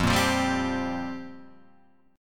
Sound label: F Suspended 2nd Suspended 4th F; Fsus2sus4 Chord {1 1 3 0 1 3} strummed